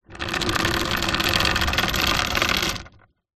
industrial chain metal medium move over wood rattle